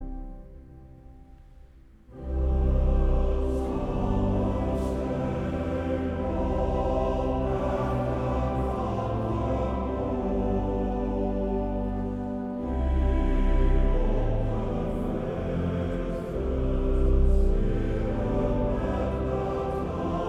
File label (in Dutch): Koor mannen